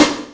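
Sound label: snare drum, FM drums; East West; Global Sounds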